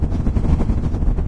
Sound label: teleport rings loop; ambient; source engine; hl2; labs; video games